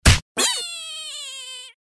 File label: hit; Farm Mania; Happy Farm